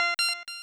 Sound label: button; mother2; Sphinx